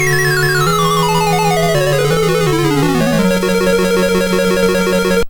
Stage Clear; Strider; Atari ST

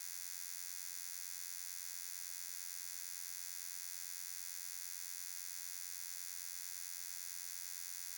light buzz; teardown; ps5 windows xbox series xs

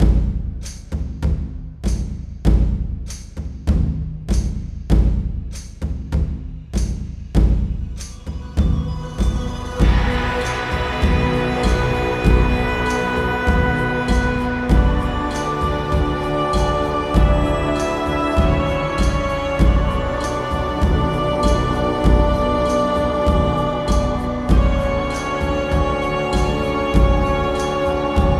Exploration Music Loop; Iron Tides macOS Windows gamerip 2017

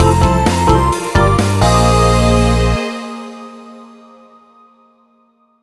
Flight Jingle; Fun! Fun! Minigolf; 3DS; Wii

